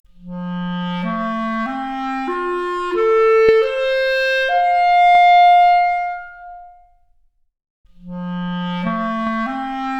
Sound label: Clarinet arp, Stereo output noised; 4bit